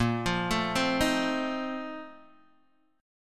Bb Major 7th Suspended 2nd Suspended 4th, Guitar, DADGAD; x,1,3,2,3,1